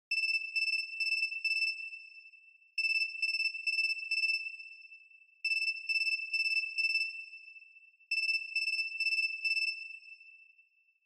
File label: Feature Phone-Ringtone (Reverb-Low)